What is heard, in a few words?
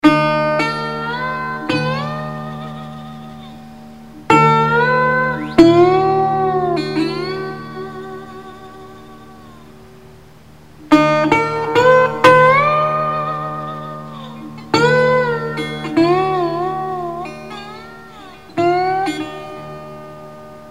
blues